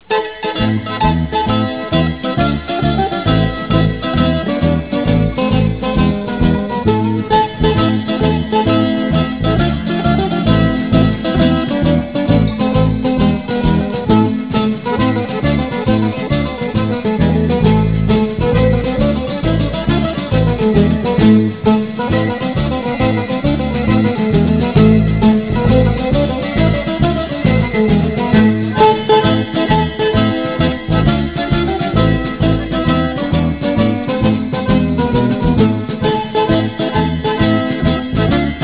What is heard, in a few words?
tarantella